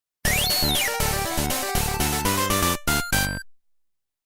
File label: Character Unlock; Sonic Battle